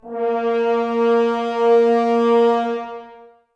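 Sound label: Brass French Horns A#; Sample CDs; Miroslav Vitous; Woodwind Brass Ensemble; F.Horns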